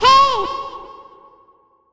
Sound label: Edm Hey Sample